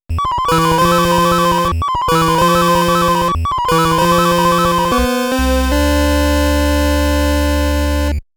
Mission Failed

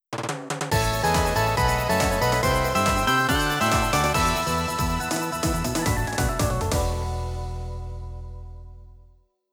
Victory; Orcane; Rivals of Aether

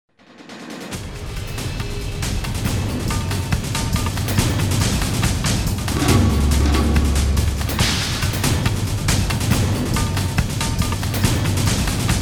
melee; Uncharted Waters Online

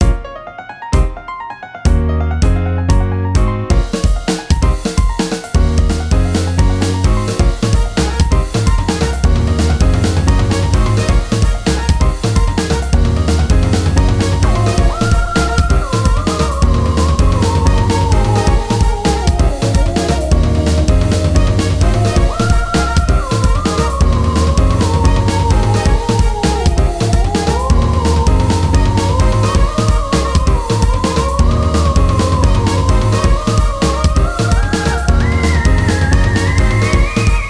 Battle Loop; pokemon rumble u 2013 wii u

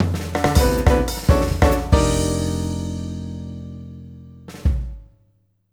Machine Test Finish; Gran Turismo 3

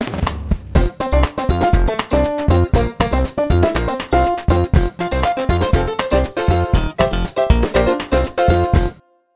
Minigolf Great Putt; Tower Unite

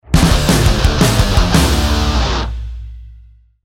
Metal Stinger; blood waves; Ident

